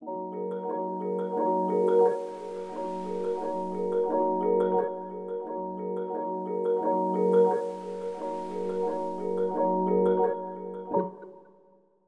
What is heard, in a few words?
Arcade Stem